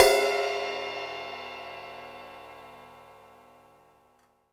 Live And Soft, Crashes; Zero-G; Soundclash

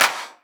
Claps; Vengeance; Essential Clubsounds Vol.4

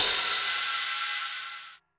Rides, Cymbals; Vengeance; Essential Clubsounds Vol.4

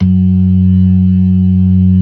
ORGAN, LOWER MANUAL

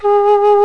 WINDS & BRASS

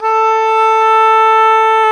WND OBOE, Oboe Brth; Roland L-CDX-03 Disk 1

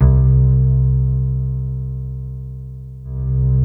C2, SynthLine

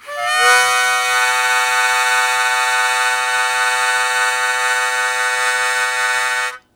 Blues Chord Attack B1, Harmonica; Zero G - Harmonica

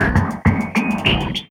Bumpers; Sonic Foundry (Sony Creative Software); Esoterik Beatz; Spitter Fx

